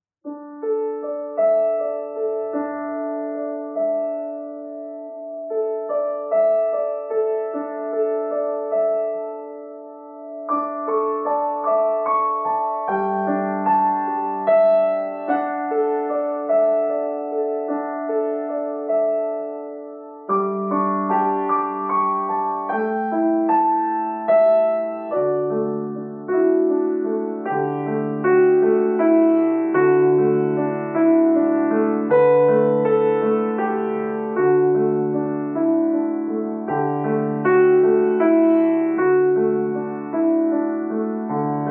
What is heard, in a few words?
Piano, Interrupted by Fireworks